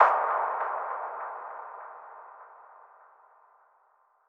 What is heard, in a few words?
Impact; Artifact; Cymatics; PHARAOH Beta Pack